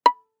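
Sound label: Violin pizzicato fortissimo on D string B5 stereo; MIS Pitches - 2014; Strings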